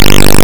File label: explosion; Each frame plays 4 cycles of waveform with a decreasing OFF delay, making the sound more rapid and urgent as the explosion progresses.; River Raid